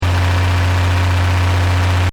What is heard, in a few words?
Engine: High Revs Loop, car; Diesel; Cars; Vehicles; Stuart Duffield